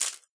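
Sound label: rattlebone, percussion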